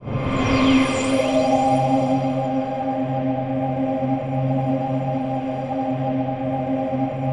ATMOPAD; Best Service; XX Large; Pads

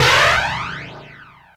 PERC; ZERO-G; Datafile

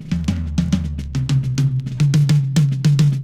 ROLLS; E-Lab; X Static Goldmine 2

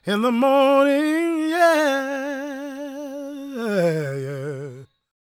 GOSP MALE, Vocal Planet Gospel

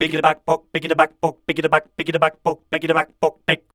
SCAT #D, Voice

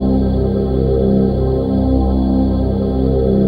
PHASE PAD, ATMO PADS; Best Service; XX Large

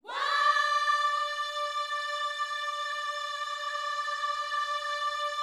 WHOA D 5, Vocal Planet Gospel; Spectrasonics; Vocal Planet Vol.1 Gospel; akai

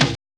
TOM

